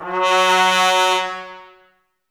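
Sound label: trumpets F#, brass; 90 s Sample CDs; Miroslav Vitous; Woodwind Brass Ensemble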